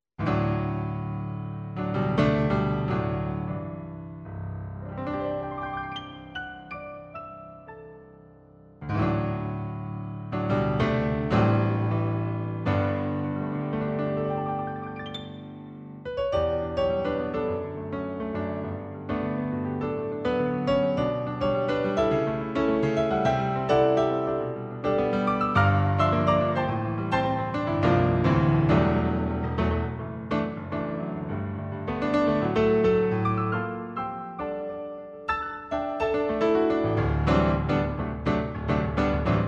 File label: rd-600 piano prologue; MIDI environment from the 1990’s; keyboard emulation